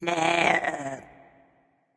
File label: confused; smite; voicepack; kali